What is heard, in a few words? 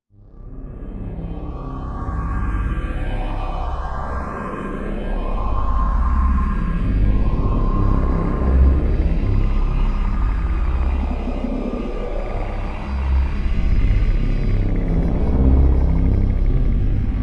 ambience; bluezone; fvtt sonniss gdc audio